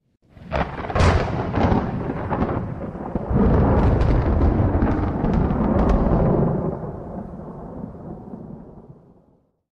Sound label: Thunder strike close perspective